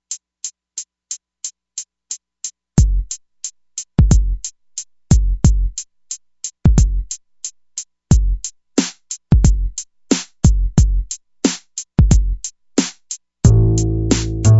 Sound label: hiphop